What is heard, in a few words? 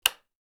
light switch